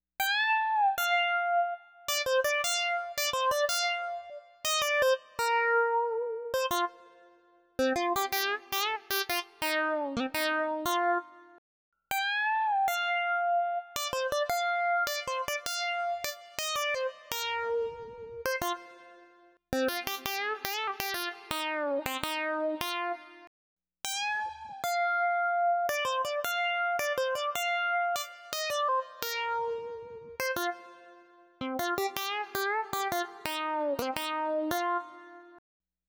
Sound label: SYNTH tracks; TB12 Review